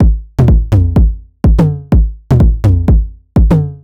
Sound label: drum xox stripped 125, Drum Loops, Classic Chicago House